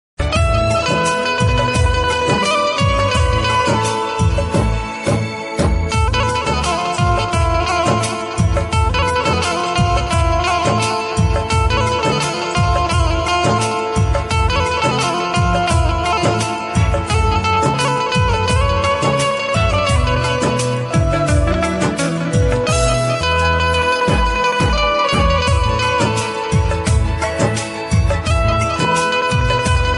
Instreumental Ringtone

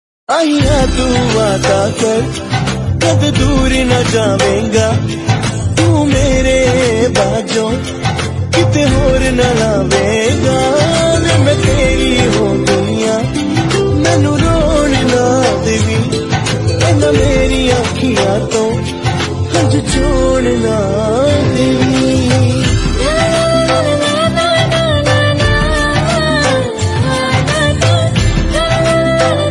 Punjabi Ringtone

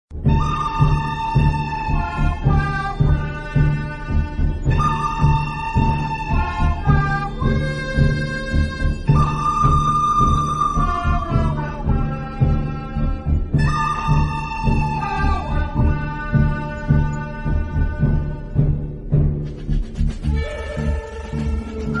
Mobile Phone Ringtone; ringtones

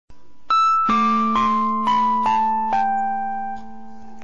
Piano Piano Instrumental Ringtone; ringtones

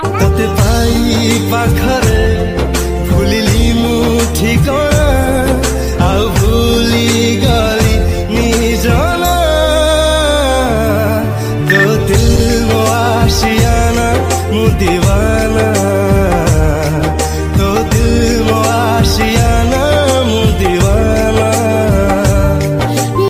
Odia Romantic Song Ringtone

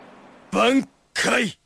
Message Ringtone

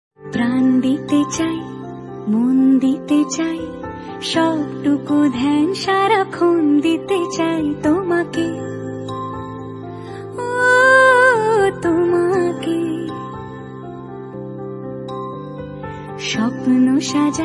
Ringtone Bengali

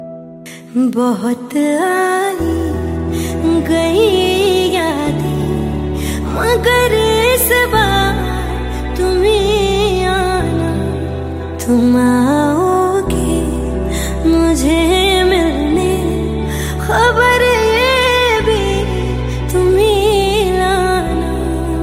Female Version Ringtone

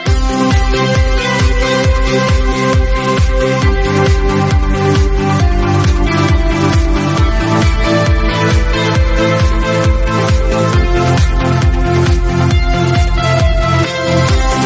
Music Ringtone

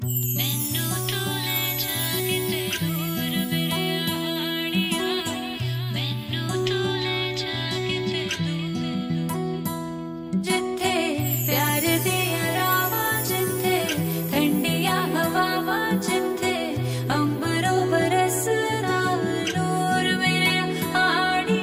New Punjabi Song

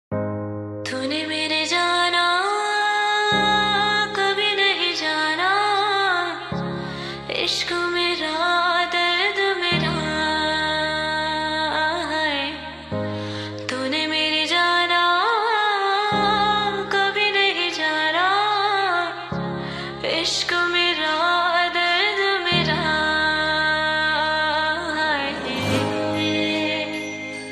Female Version Song Ringtone

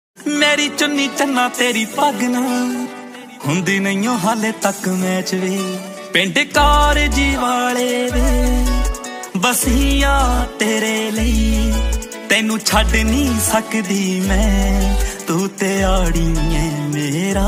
Punjabi Song Ringtone

